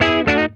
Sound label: E-B PASS, Funk Guitar; Zero G - Funk Guitar